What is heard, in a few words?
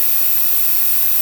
frag grenade loop